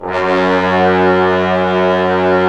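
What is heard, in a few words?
trombones; Roland LA Composer vol. 4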